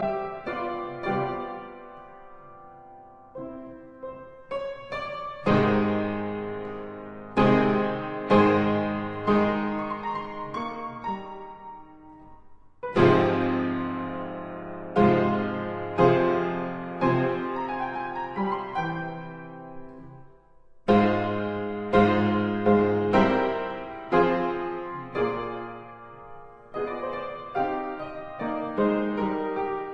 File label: Keyboard Grande Sonata in C major, Andante molto Allegro con spirito